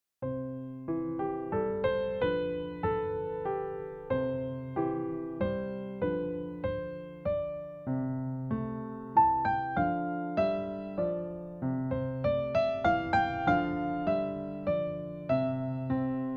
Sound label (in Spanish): Dos voces